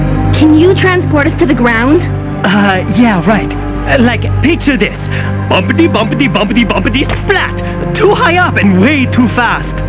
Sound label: gr splat